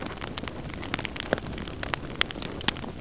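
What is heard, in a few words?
fireplace; Renaissance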